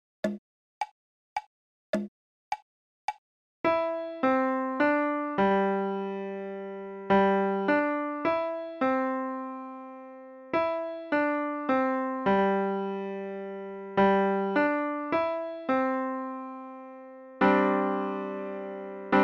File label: Chimes